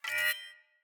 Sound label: Sword Whoosh Accent; Weapons; Swords; Garren Sword